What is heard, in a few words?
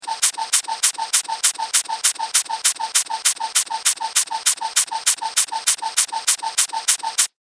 Cartoon Repetitive Pump